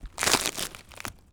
wood breaking cracking snapping breaking peeling bones break snap crack; Matt Script; You Me & Debris